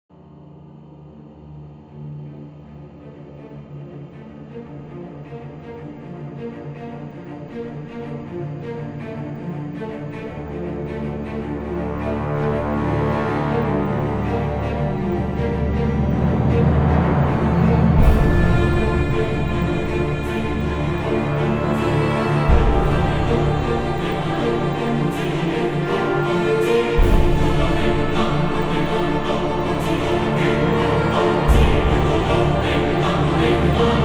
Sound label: Epic 160BPM, Cinematic Trailer Music; BlueFX; Epic Soundtracks